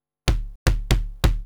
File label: PERCUSSN DISCO 125; Drum